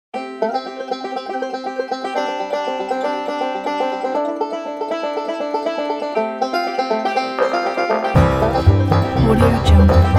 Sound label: jaw harp happy